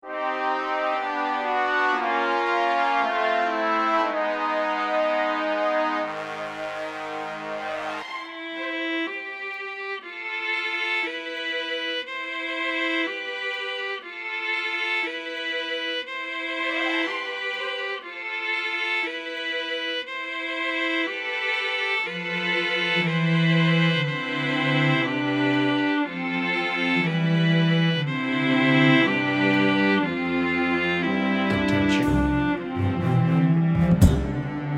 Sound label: orchestral experiment